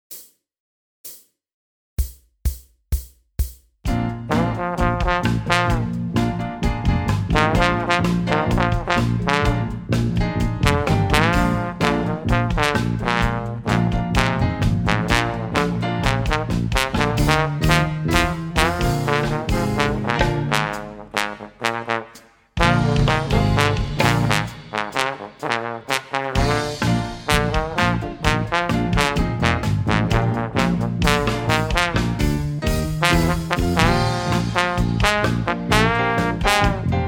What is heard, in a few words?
sample solo rock trombone